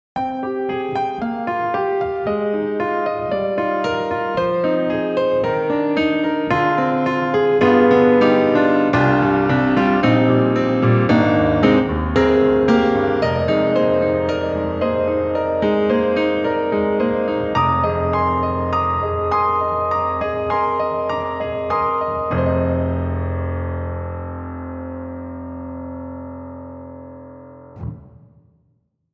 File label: Grand Rhapsody Piano, In Concert; Waves